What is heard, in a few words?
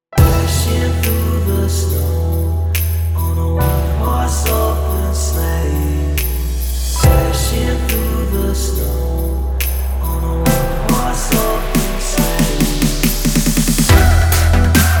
ONE HORSE OPEN BASS DROP